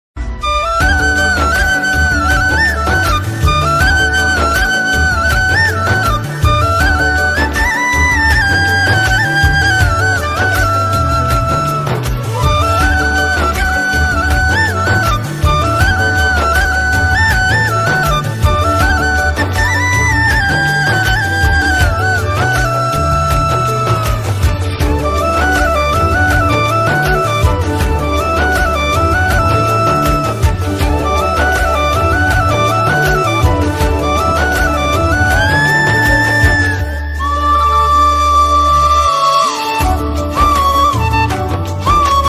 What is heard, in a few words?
flute version song ringtone, new flute ringtone sad ringtones